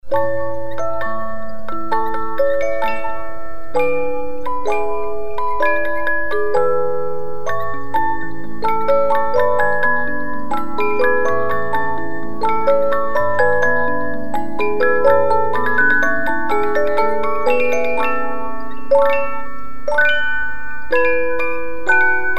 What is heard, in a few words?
Eine Kleine Nachtmusik Allegro, 50-Note Movements